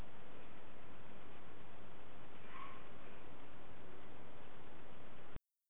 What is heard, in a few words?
High Pitch Scream